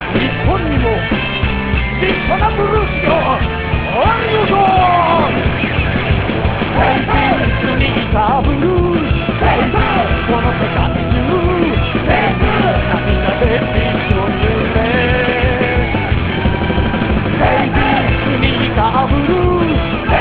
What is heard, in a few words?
blues